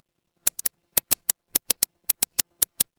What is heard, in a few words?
office pen